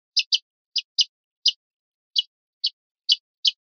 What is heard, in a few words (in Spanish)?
miedos ahipados, pardillo